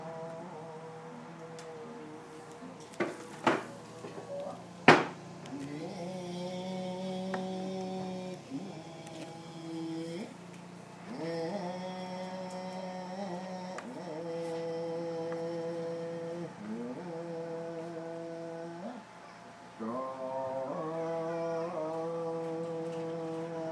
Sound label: monk chanting shoren in